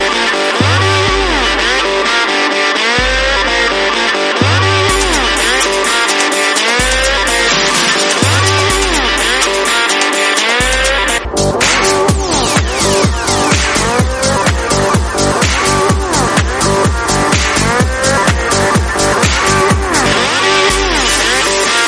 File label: Rocky Guitar On F